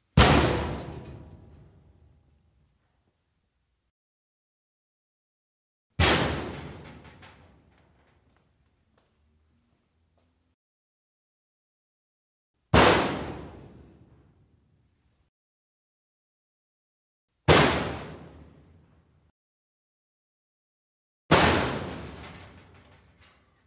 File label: Hitting Closed Locker, lockers